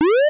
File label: appear